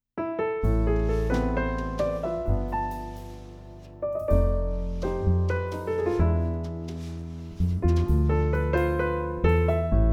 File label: Jazz Band; San Diego Jazz Pianist 1; Origin Records